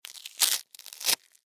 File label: Household Fly Paper Movement Sticky Short; Cleaning